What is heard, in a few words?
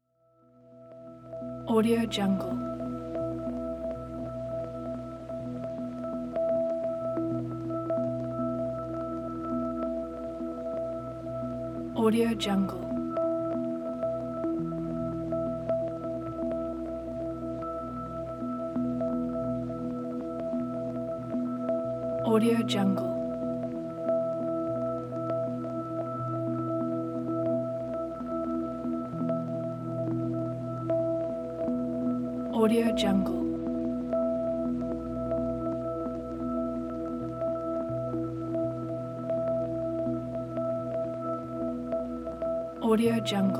light ambience drone